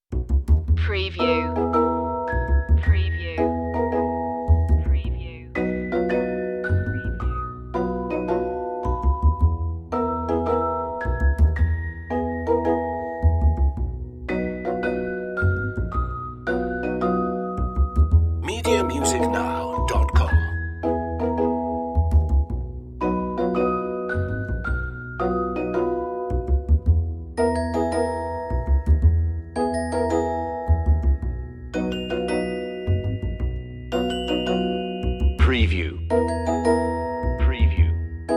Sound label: candy house no perc